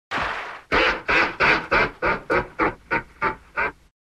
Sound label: television logo scary; eddie murphy